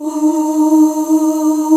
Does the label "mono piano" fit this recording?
No